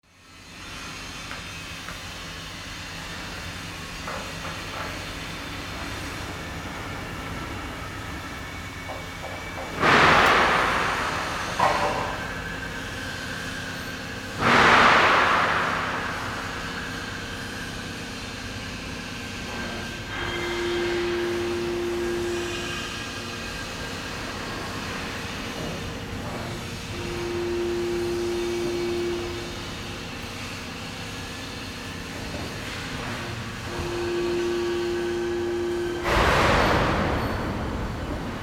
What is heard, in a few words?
shipyard atmo